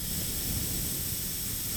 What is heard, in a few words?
steam leak; cstrike; kzra stairway